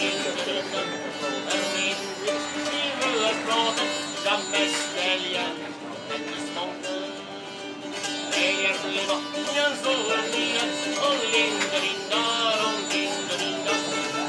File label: breton folk music